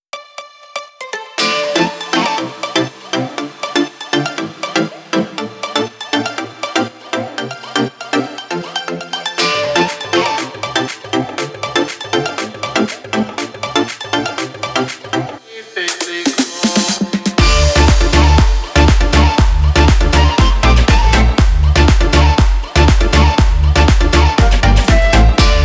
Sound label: house electronic techno; By Burak Sound Bank; house electronic techno vol 9